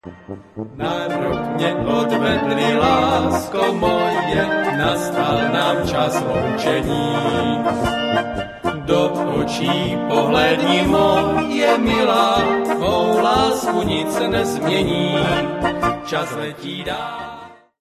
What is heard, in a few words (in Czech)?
polka